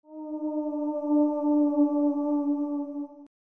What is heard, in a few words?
MUSICAL